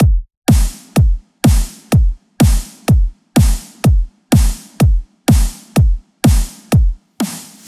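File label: Bass House 125bpm Stripped; EDM Drums; Drum Kits; Stems; Ghosthack; Ultimate EDM Drums; Bass House Kit 10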